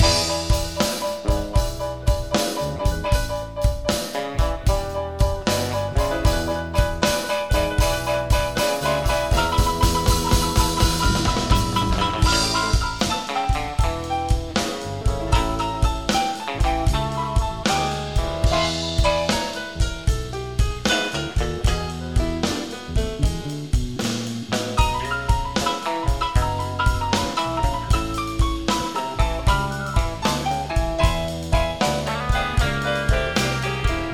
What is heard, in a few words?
Uptempo Pentatonic Slow Blues 6 8 feel 12 bar Piano Soloing